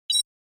Cyber

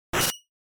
Fighting Defend

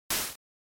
NES General Noise Single

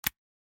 Cell Phone Hardware Open

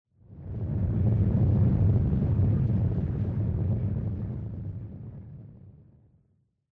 Rumble Gentle Short; Onoma

